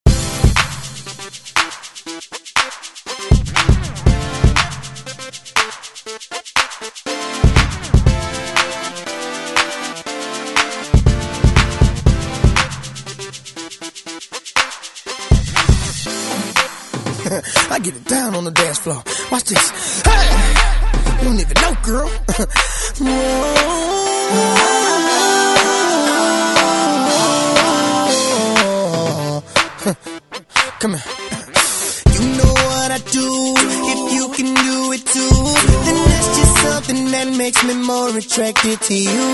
Straight Kutz; DJ Mhark

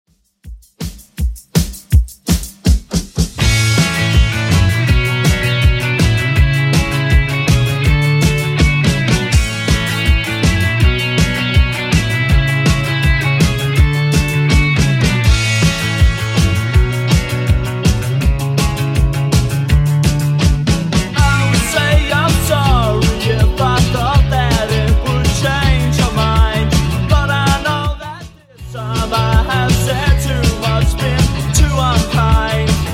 80s 162bpm; Xtend Edit

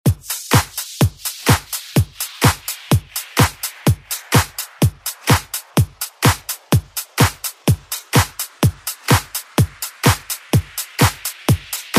House Ext Redrum

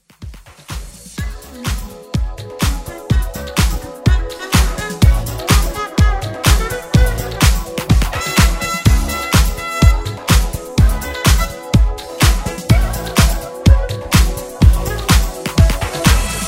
80s Redrum

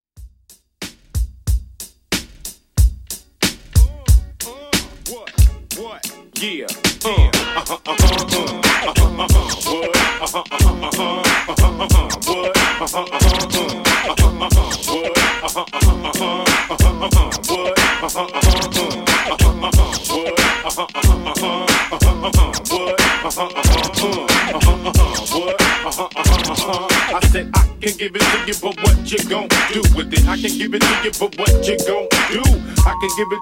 90s Old School Redrum 92Bpm Dirty

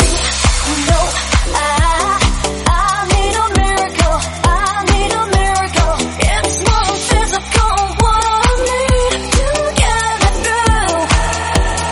Tech House 135 Em

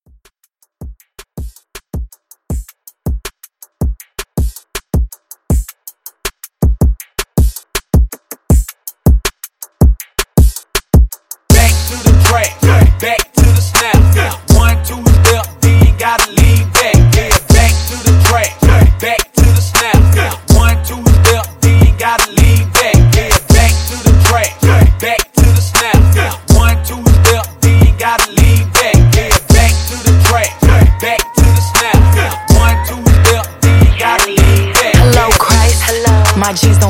Remix Hype Redrum; Remixfreakz

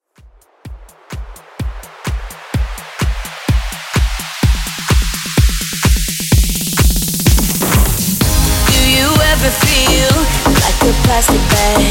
Bootleg Redrum 127Bpm

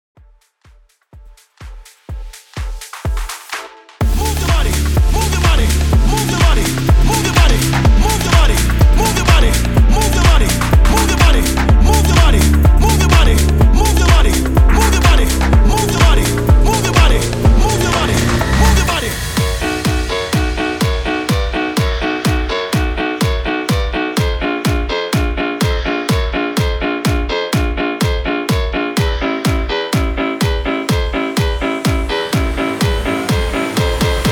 HALLOWEEN 125 BPM

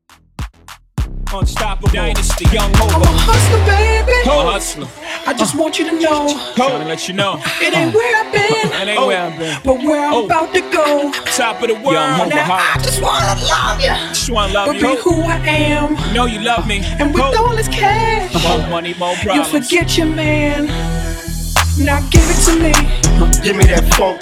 Acapella Out 102 BPM

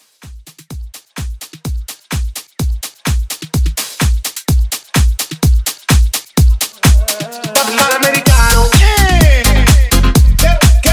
Club Blend 127

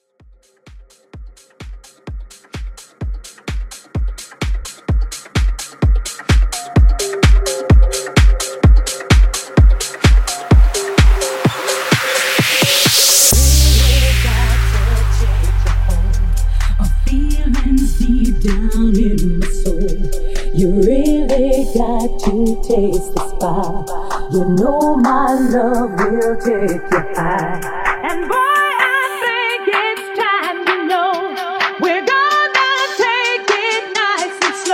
Funk

